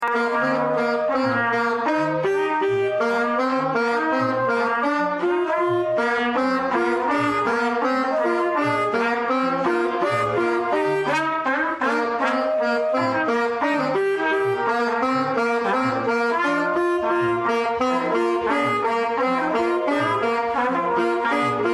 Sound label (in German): blaeserklasse; ostergruss 2024